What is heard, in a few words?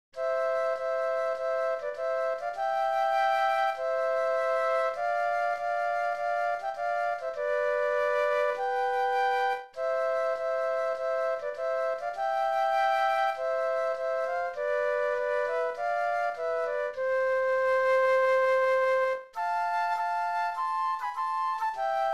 Flute Duet